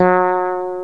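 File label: fretless bass; NEW AGE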